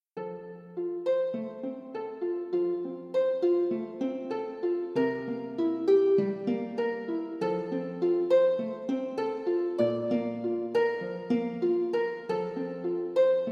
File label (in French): Accompagnement echauffement berceuse; Échauffement berceuse marchand de sable; la berceuse du marchand de sable; chanson de Cycle I